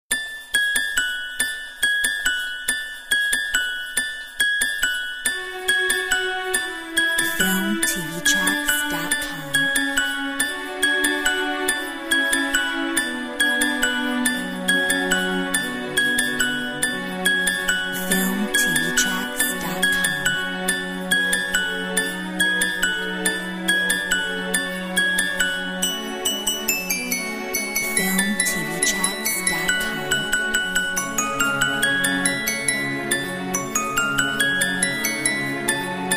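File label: carol of the bells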